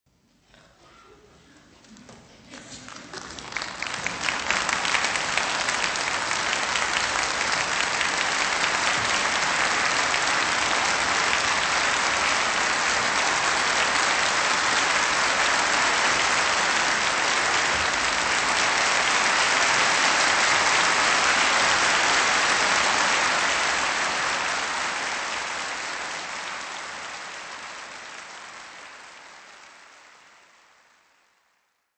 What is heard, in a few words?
Applause